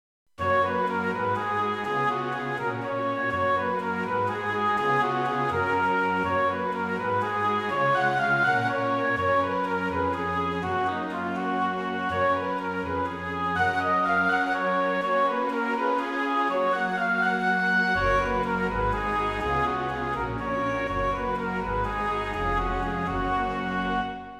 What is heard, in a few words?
jazz orchestral melody